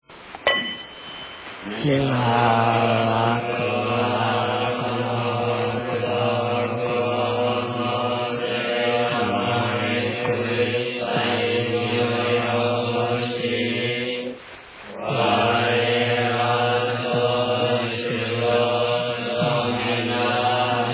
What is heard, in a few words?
end chant